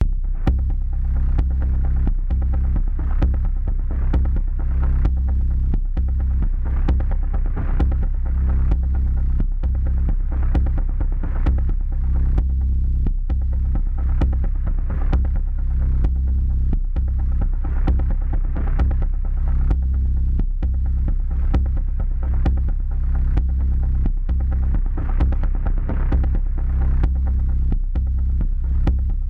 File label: Dirty Pulse C 131bpm